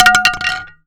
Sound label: Aluminum Collision, Bat; zps; Foley